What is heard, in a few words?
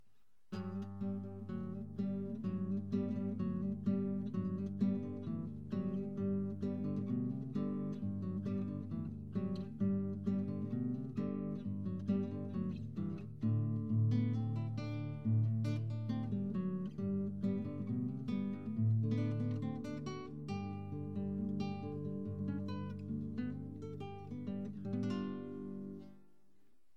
Hawaiian Slack Key